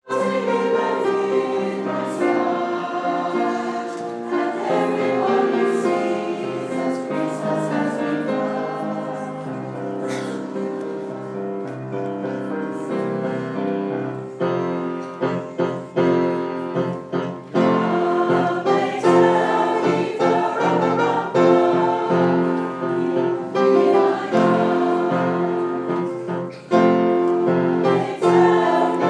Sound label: staff choir; the montpelier